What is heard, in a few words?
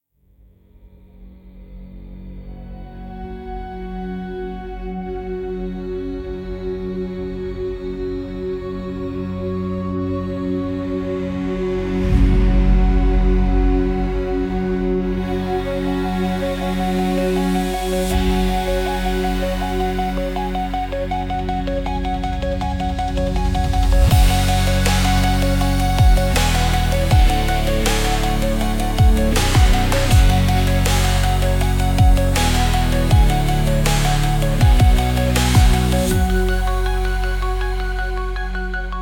ambient, instrumental